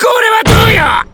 desk slam; terumi

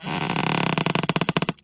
bend; oscar

